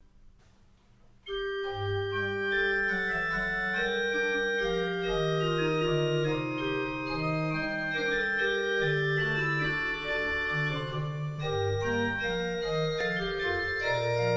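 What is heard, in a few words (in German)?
Godefroy Hartmann 17 II P, pfeifenorgeln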